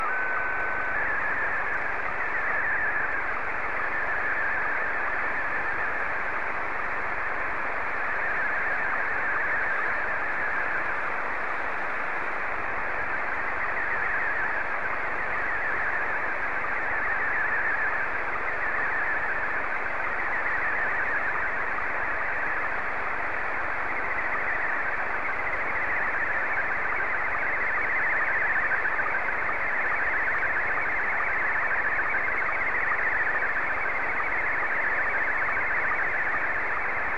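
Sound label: SSTV, Scottie 1; JQ3OEN; VK4MSL SSTV RX @ QG62ln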